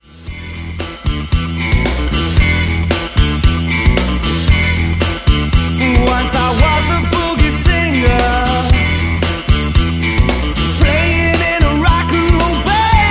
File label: Amplified band, studio sample